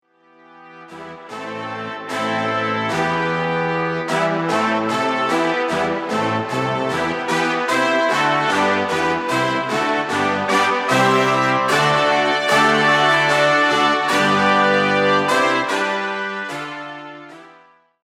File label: brass